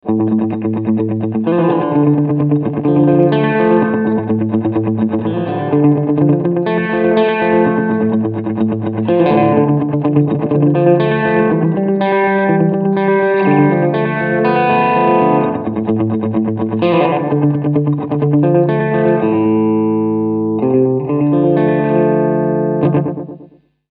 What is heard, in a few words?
Slapback Delay